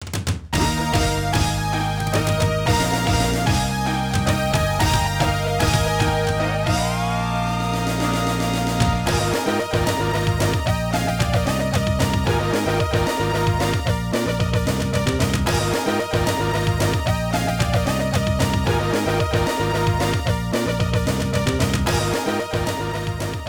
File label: Goal